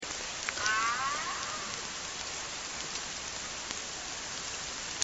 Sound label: rising long